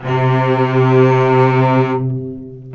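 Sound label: cellos attack C3 R, String; arco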